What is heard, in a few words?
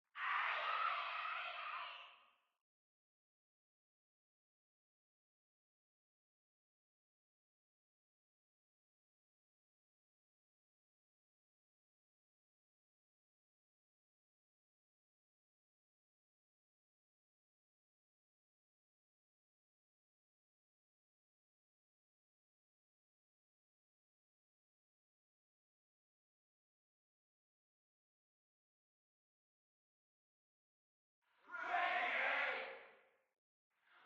crowd